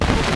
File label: Static Glitch